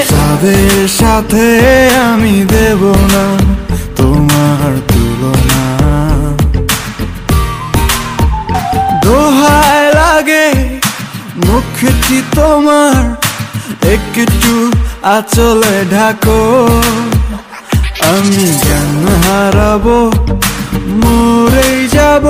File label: Remix Bengali Song Ringtone